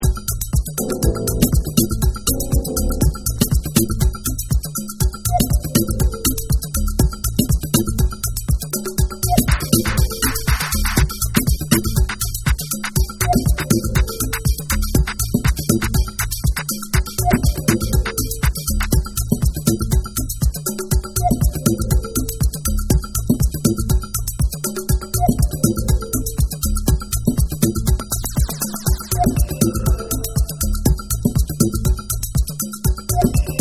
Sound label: Deep Bass Dub